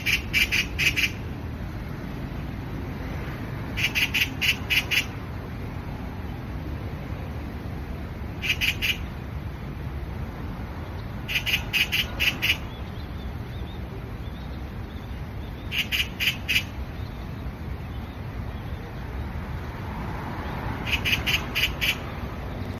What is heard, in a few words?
Oriental magpie